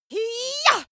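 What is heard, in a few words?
female combat attack long, screams; schreie; menschen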